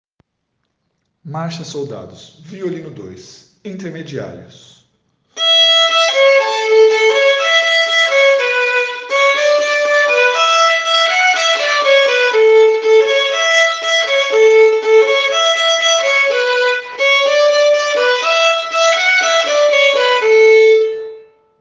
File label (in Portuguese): Violino 2; violino kids; Marcha Soldados